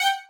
violin

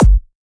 Dr Kick

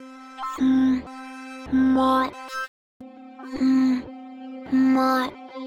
hmm bass; 音MAD; 少女终末旅行; 소녀 종말 여행 음원팩; Syaro Sample

